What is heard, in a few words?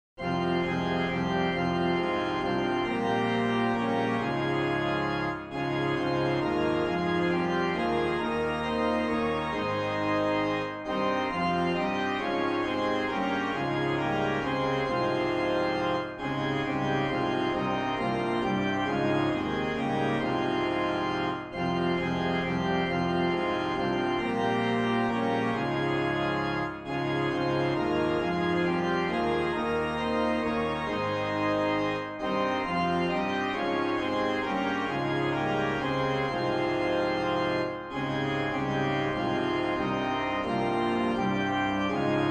Organ